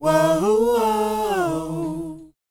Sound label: WHOA; ILIO; Vocal Planet